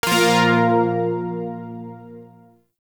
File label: Shawzin Chromatic Chord; Zariman Shawzin